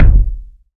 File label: tight bass drum D# key; Duration 0:01; Samplerate 44100 Hz; kick drum samples